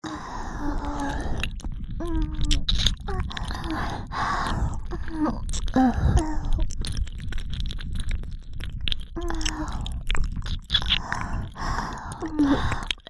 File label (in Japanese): 両耳舐めループ 耳塞ぎ; 耳塞ぎ舐めver